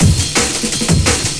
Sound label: dnb amen